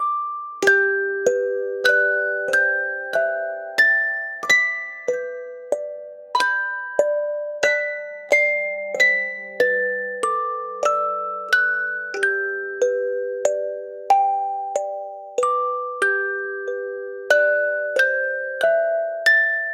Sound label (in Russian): muzyka dlya sna detej kolybelnye pered snom ukachivayushie melodii i zvuki dlya; Музыка Для Сна Детей — Колыбельные Перед Сном, Укачивающие Мелодии И Звуки Для Крепкого Сна; Детские колыбельные; Колыбельная Музыка Для Детей